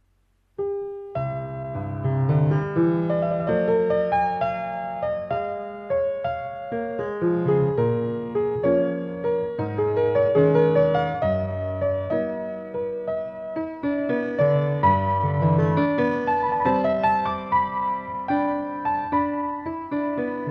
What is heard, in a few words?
piano